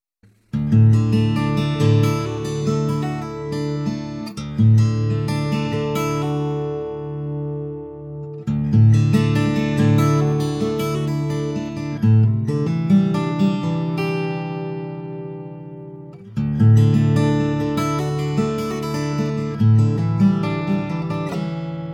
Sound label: Guitar